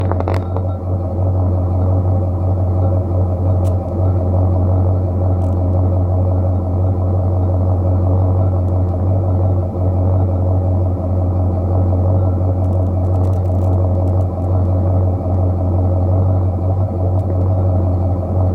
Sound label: Passenger Exhaust